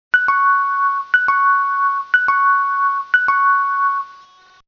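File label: emergency check advance notice signal; kinkyukakunin yokokusingou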